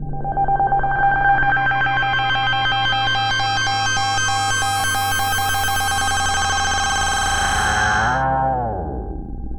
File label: Fireworks Circuits; Sonic Foundry (Sony Creative Software); Ma Ja Le Ethereal Textures